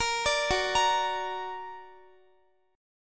F sharp Augmented F sharp Guitar; x,x,20,19,18,17; arpeggiated

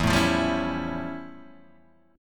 F Augmented Major 9th E

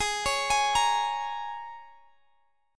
Bb Minor 7th Ab

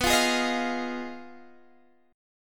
B 6th Flat 5th B, Guitar, Standard; strummed; x,14,15,13,16,13; capo=2

